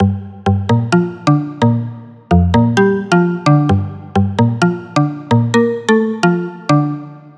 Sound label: Loop 130bpm G Basspluck Conga; Ghosthack